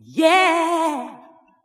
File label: "yeah" sung